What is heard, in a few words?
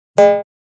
Pong; Pings; multimedia